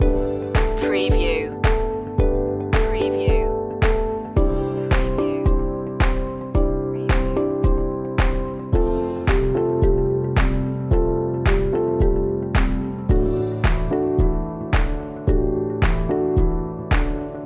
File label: corporate dreams loop; explainer